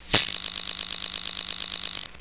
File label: Insect; 特酷声音素材库